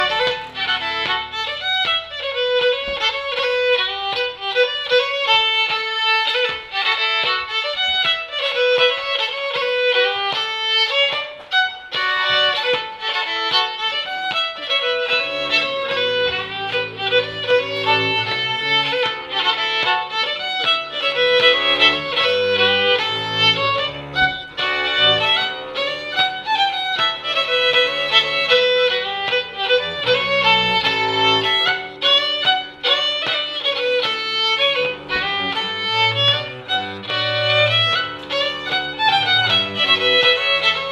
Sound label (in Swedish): Schottis f Hälsingland